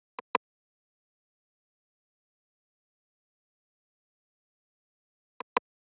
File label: Drum Loop 92 BPM Percussion; Loop Stems; Cymatics; Vibes II Drum Loop Collection; Freefall Drum Loop